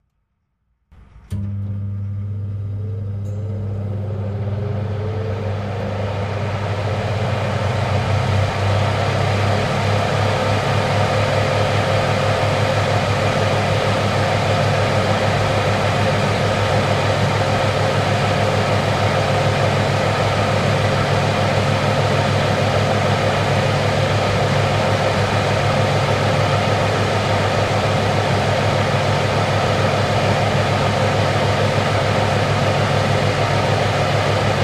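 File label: Oven Electric Fan Turn On, fans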